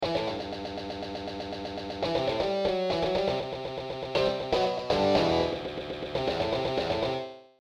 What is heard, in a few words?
verse riff; Whispering Trees